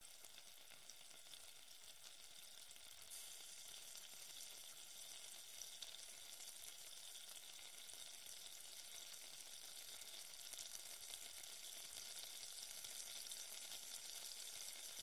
glitched tones household kitchen cooking butter sizzle pan